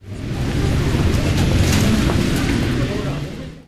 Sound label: town noise